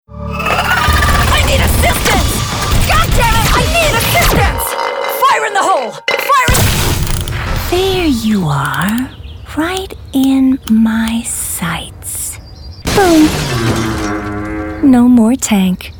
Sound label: Video Game